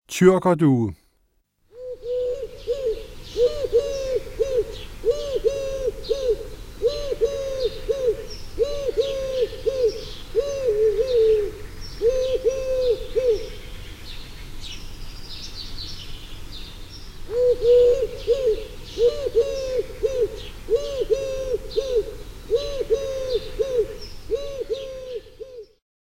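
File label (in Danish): Tyrkerdue, Fugle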